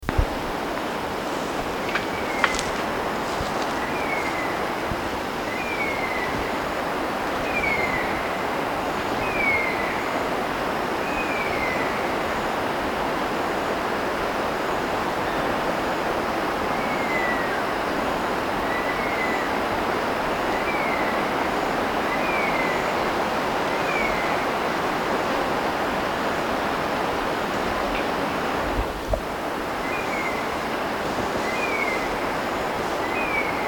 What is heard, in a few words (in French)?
aigle des singes